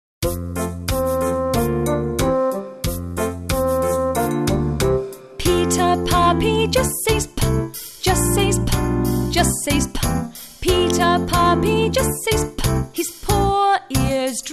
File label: ALPHABET SONGS; PETER PUPPY